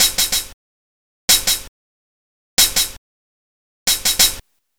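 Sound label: MPC4 hi-hat; USB Soundscan vol.02; Underground Hip Hop; AKAI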